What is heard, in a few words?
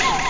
space orgasm, voices; The Epic Collection 3; Epic Marketing; muzak samples